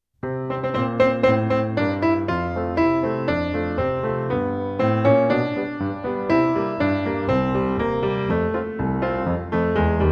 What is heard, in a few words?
accomp, trumpet; Yankee Doodle Boy; Hal Leonard - The Canadian Brass Book Of Easy Trumpet Solos